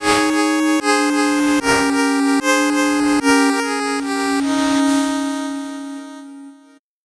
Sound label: 8bit jingle normal